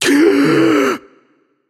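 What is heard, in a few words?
nonverbal breath; voicepack; smite; merlin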